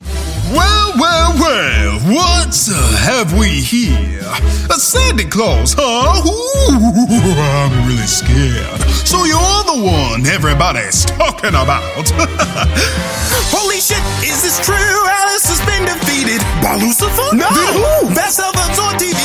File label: Singing